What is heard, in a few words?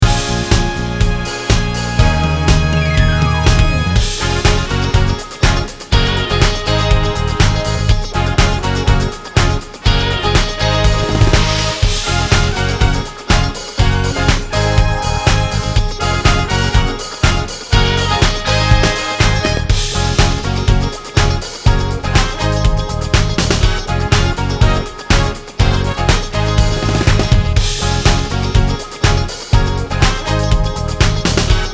Ab m Piano o Backings